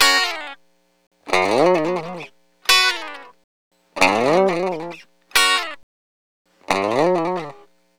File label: Lounge Gtr F# Dry; Zero-G Kocktail Kollection; Construction Kitz; Welk Lounge 090; 90 s Sample CDs